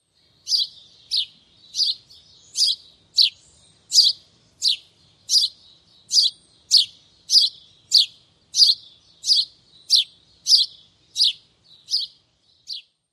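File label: House Sparrow, song number 1; NY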